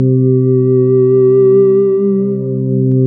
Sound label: SYN K-2000; Sound & Vision - Gigapack I CD 2